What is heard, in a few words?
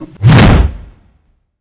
hammer slash